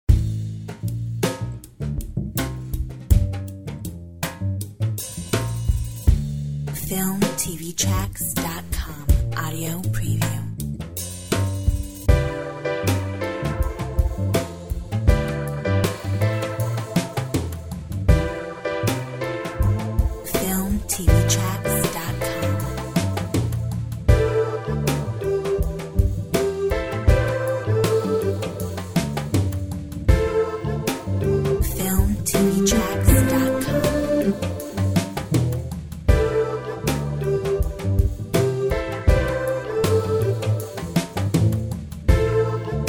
Smooth Jazz Cruise

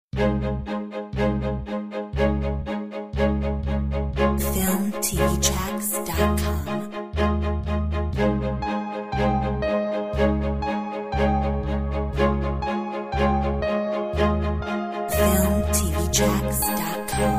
Corporate pride

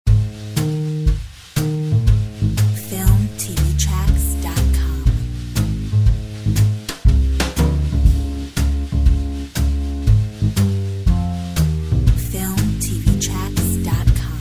Drum bass; Filmtv-tracks

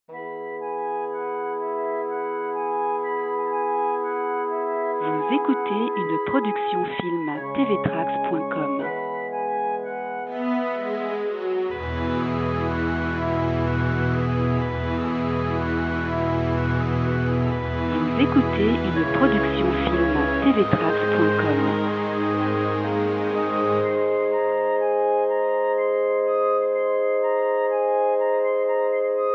Matin Peer Gynt E. Grieg

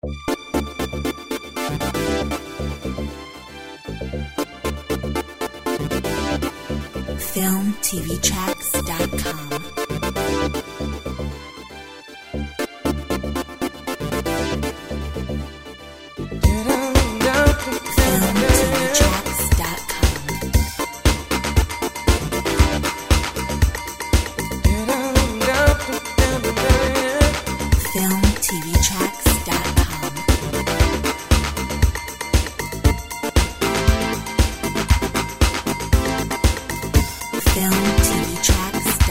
Disco Express

